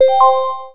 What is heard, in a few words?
alert